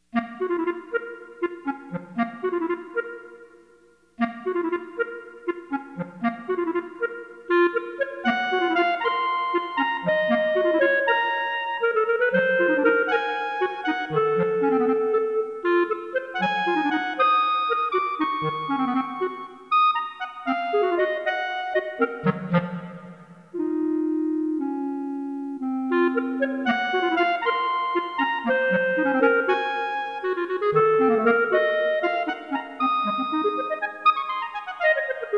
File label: TANGO, Duet; CLARINET